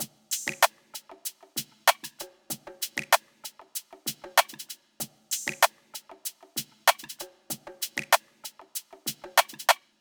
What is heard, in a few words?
afro drum loop groovy 96bpm